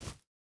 footstep hide forest jump start; Rust

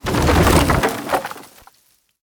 impacts; World of Tanks

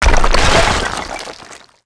fence stone wood; World of Tanks Blitz

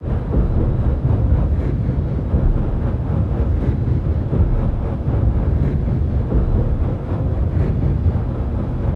submarines engines; World Of Warships

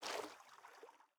footstep water med; Rust

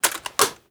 topcover close; Rust

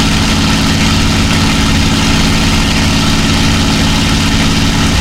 mustang cockpit, engines; War Thunder

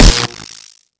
tunneler worm discharge

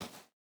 footstep barefoot dirt jump start; Rust

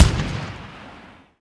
imperial carbine 1shot far

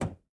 throttle lim, aircraft; DCS

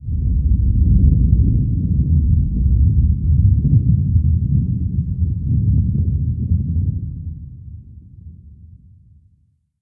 earthquake rumble; Call of Duty Modern Warfare

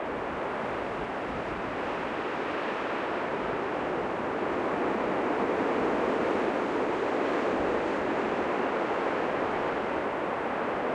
ambient; World of Tanks

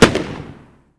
Inf Weapons; Red Orchestra 41-45

